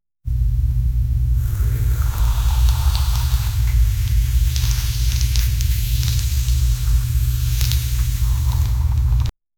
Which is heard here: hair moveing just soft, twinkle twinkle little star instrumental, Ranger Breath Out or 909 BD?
hair moveing just soft